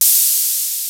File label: JD-800 KIT